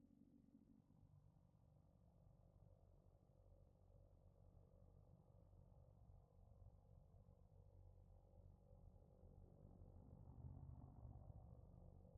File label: Tonal Brass Metal; BeautifulNoise; Intensity by Design